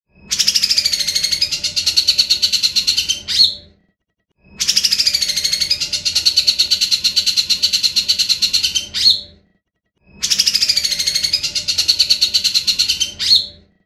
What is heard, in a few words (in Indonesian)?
suara cucak jenggot isian